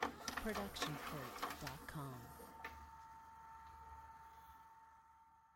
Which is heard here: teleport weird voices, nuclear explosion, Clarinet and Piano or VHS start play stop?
VHS start play stop